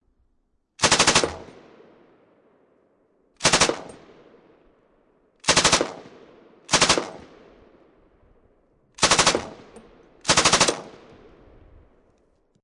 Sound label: M249 Auto Barrel, Machine Gun; M249 3 Burstss Bridge; VRSonic; Guns